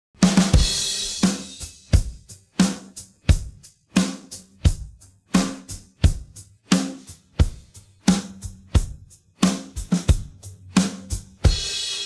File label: pattern, drum